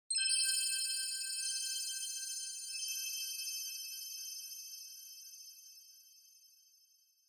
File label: Onoma Sparkle Long; オノマトペ・キラキラ